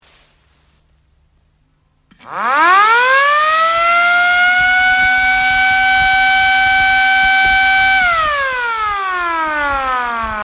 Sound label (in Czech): sirena